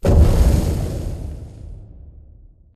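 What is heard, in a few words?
Explosion Rumble Exterior Distant Punch; Boomtown; Titanfall 2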